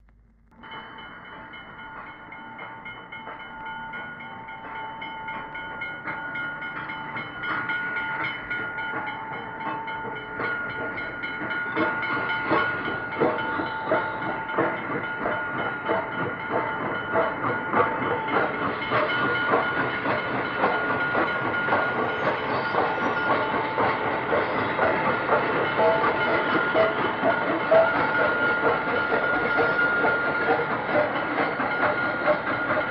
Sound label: L-1, steam; Riverhead; Steam Sounds, Steel Rails